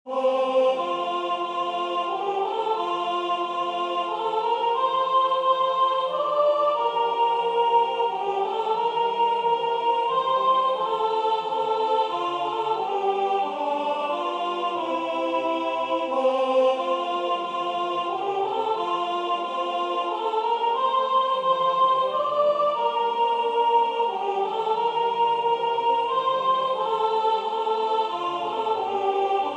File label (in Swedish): SOPRAN